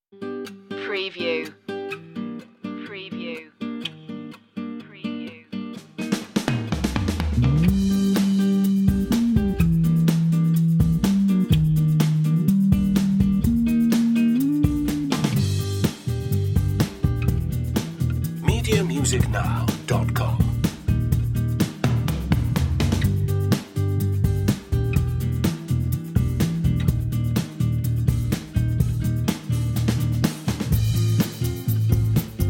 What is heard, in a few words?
got game underscore no keyboard